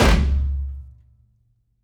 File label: DHOL ONESHOT, Dhol Tasha Oneshot; production-ready dhol and tasha oneshot sample; synthstudiosind